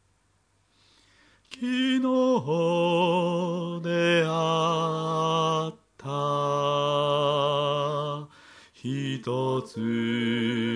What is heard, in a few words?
bass part